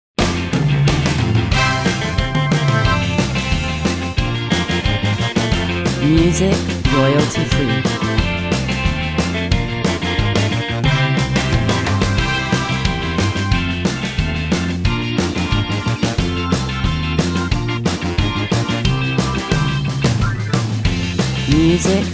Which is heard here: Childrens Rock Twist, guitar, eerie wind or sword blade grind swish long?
Childrens Rock Twist